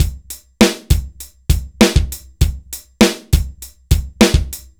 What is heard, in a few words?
dry loop, BPM100